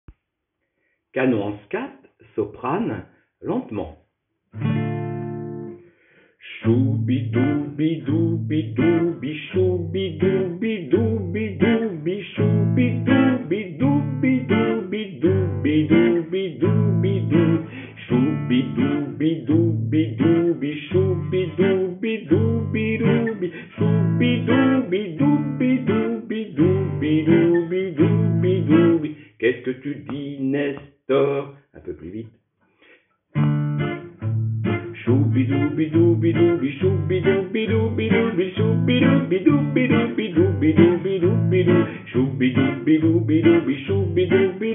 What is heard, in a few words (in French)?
canon en scat sopranes